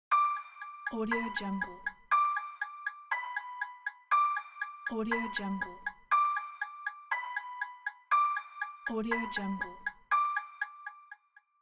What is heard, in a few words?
clock